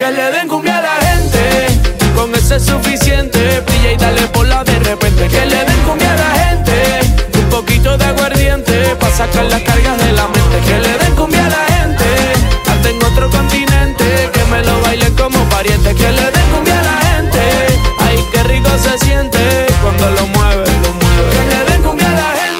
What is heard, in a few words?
Cumbia